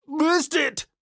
sea bouy miss; donnie; Blind Drive

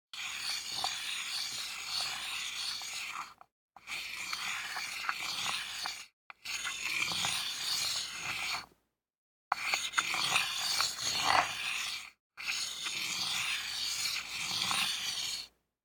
DICE on Wooden Tray, Slide, Standard, 1 One Die; Samples; ArticulatedSounds; DICE Sfx Party (Extended)

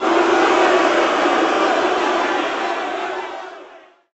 BMX Crowd Boo Medium; Crowds

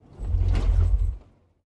soft road bump; Blind Drive

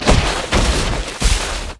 Bodyfall Hit Roll Slide; SFX Kit; Bodyfalls

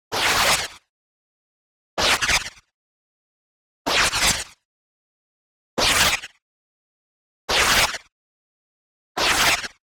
WHOOSH Noisy Air Whoosh; boom library; Anime Essentials

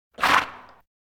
Animal effects snorts grunts farm ambience

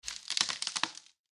Broken Willow Tree; Blind Quest - The Frost Demon

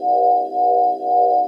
Scifi Hover Drone Loop; SFX Kit; Sci-Fi Loops

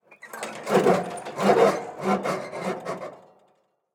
Semi Truck Mac; Blastwave

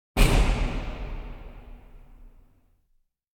Automobile In Parking Garage Door Closing; KMP Film Video Sound Effects

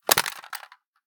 mice wireless drop; Genius; NS Studios - ultra sound collection

